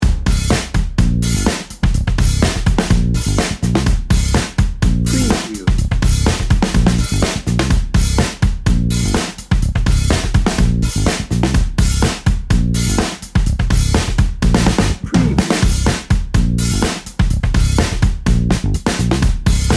festival of funk

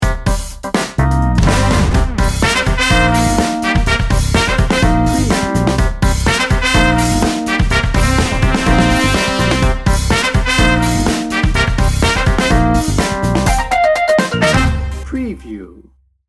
festival of funk

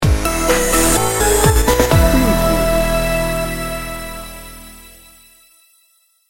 joyful edm sting bumper

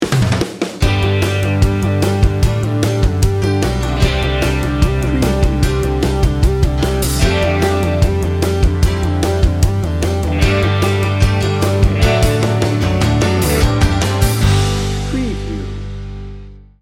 motivational pop rock

